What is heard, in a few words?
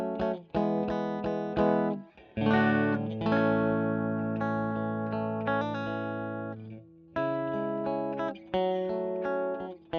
E. GTR Royer